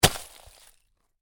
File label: Ice impact lite, Ice: light impact with debris; Snow; Weather; Stuart Duffield